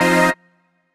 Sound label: HOUSE, House Dance